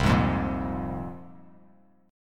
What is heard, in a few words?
C 7th Suspended 4th Sharp 5th, Bass Standard; C7sus4#5 strummed